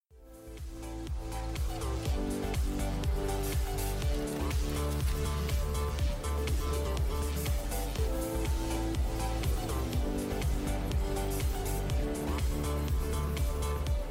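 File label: Background Music, Dice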